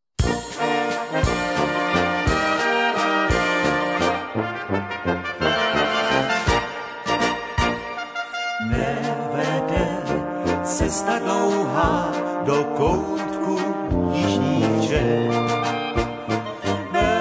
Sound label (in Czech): VALCIK